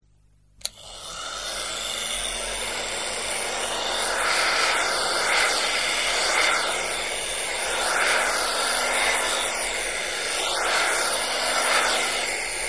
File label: Hair Dryer; SOUND EFFECTS1